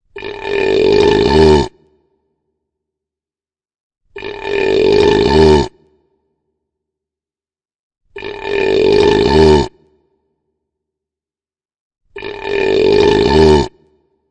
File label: Deep Burp; Human Vocal Ringtone